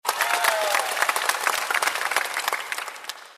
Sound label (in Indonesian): tepuk tangan